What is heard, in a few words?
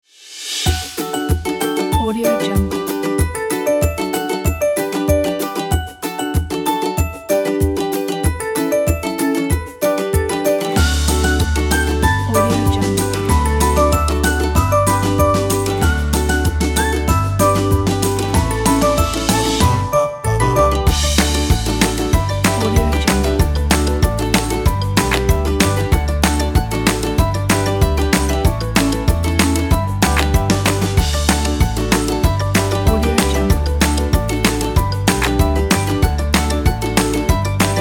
the cheerful